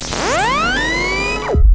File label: Strider Buster Arm, weapons